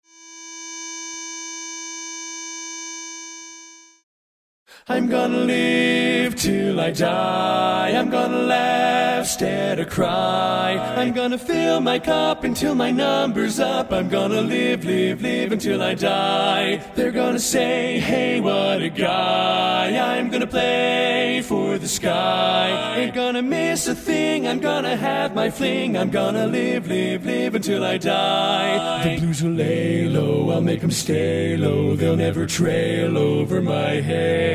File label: Lead